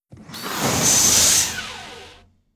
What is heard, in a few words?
event airlock; Stellaris